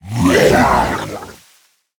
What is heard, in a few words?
Bog Creeper Attack